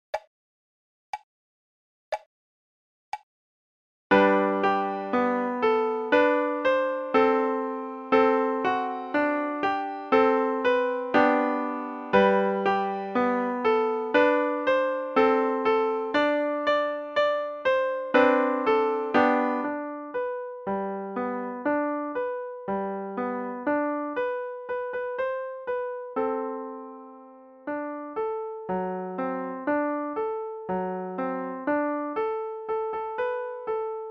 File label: Shoo Fly Slo